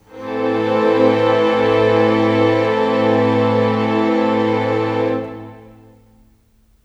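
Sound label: Pad A minor, Orchestral; Sonic Foundry (Sony Creative Software); Orchestral Series VOL-4 - Rock and Pop; Pads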